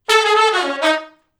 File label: Funk Riff (B) unison, Horncraft for R&B; Sections; Sonic Foundry (Sony Creative Software)